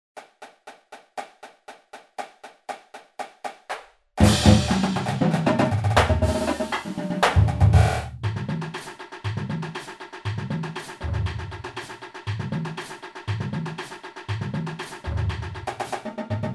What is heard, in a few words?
street time, percussion